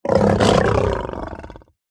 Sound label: Groovy Cat, Attack